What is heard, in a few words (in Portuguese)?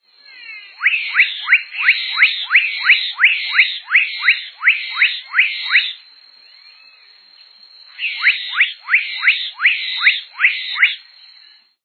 japacanim